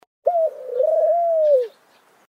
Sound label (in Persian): صدای قمری